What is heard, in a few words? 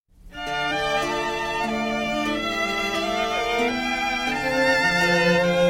String Quartet